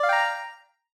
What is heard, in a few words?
magic